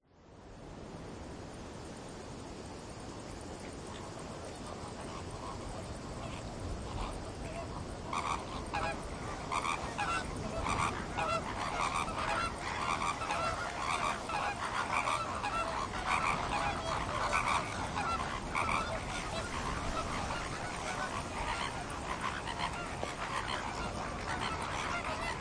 Chant des flamands roses Binaural

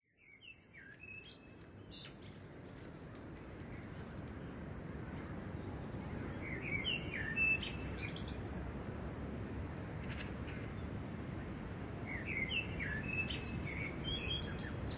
oiseaux ponant aube binaural

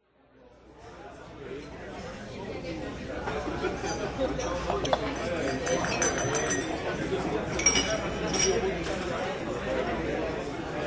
ambiance interieur restaurant Binaural

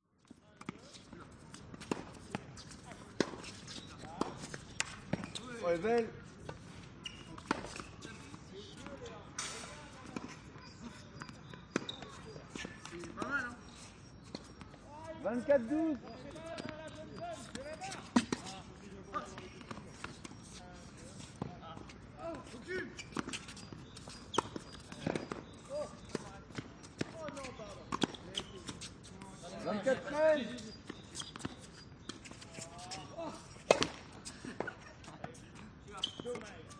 Partie de tennis Binaural

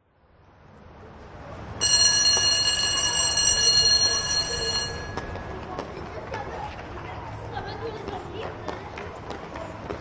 Cour de recreation Binaural

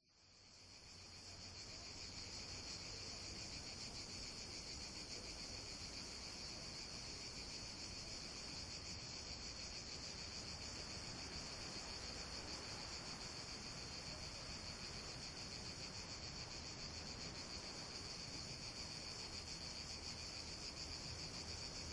Cigales Binaural

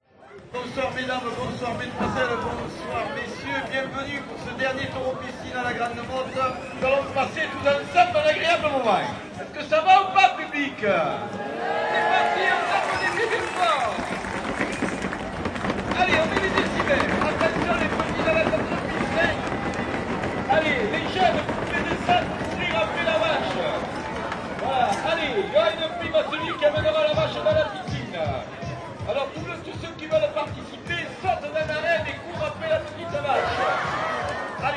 toro piscine binaural

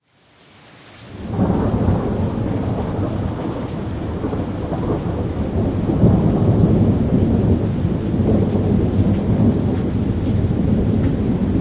Orages et tonnerre Binaural

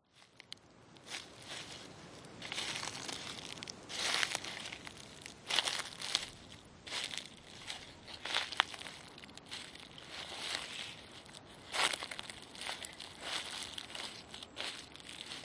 Bruit pas feuilles mortes Binaural

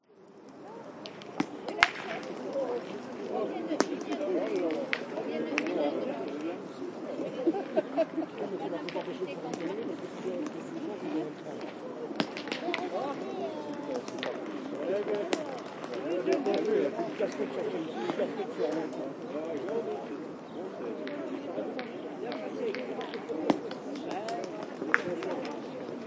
partie de petanque Binaural

